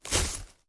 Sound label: take weapon; STALZONE